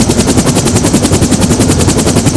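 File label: osprey engine; zm lab meat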